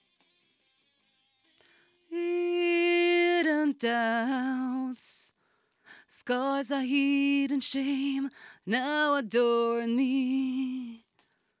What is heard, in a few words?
Vocal